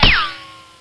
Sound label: vest; quake2